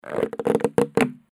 rubberband tension

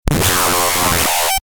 energizing event

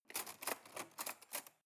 cutlery cake fork drawer handle

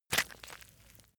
leather impact mud soft squeeze

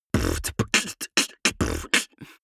beatbox sequence damped

